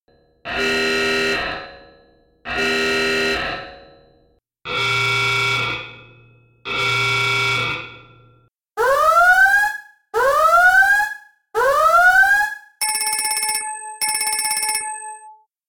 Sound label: alarms